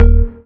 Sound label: Synth Bass; Vengeance Samples; VEE Synth Shots